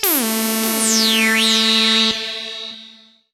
lead syn, Techno Trance

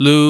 VOX LOO D4, Scats Choir; 90s Sample CDs; Club-50 - Foundations Roland; Short Scats

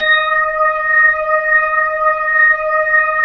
B-3, Jazz

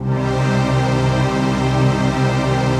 CHRD PAD; Best Service; XX Large Pads; Sample CDs

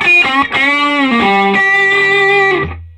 SOLO G, Vintage Blues Guitar

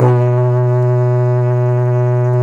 HORN, Didgeridoo and Other Primitive Instruments; Big Fish Audio; DIDGEHORN1

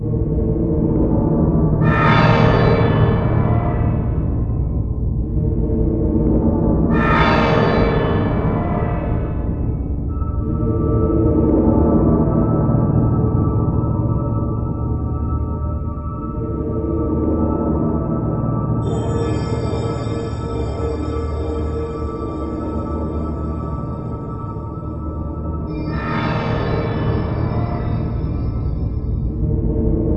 evil machines; Zero-G Ambient 1

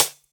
Hat; M8; Electronisounds

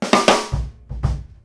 KICK SNARE, Live Snares

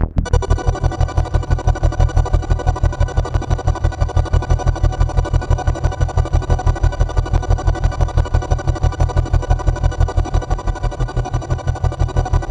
C2, SynthLine; 90 s Sample CDs; NiceBeats; SynthLine VOL-7

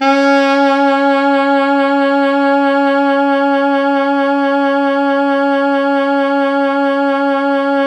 Pop Brass, C#3 -L; Best Service ProSamples vol.19; AKAI

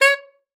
C#5, saxophone; Best Service ProSamples vol.42 - Session Instruments

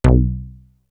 HIP MOOG; East West - Global Sounds; PROC.BASS

